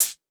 Hat Hit, Bmin; Hip Hop; Hip Hop Exotica; Apple Loops; Big Fish Audio

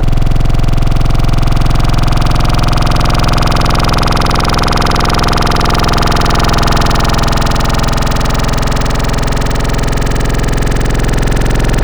Remappa, Growl Tables; Cymatics; Growl Tables Vol 2; Serum

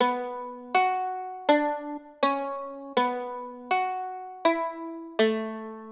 Mandolin, 081 Bmin, Hip Hop Exotica; Apple Loops; Big Fish Audio

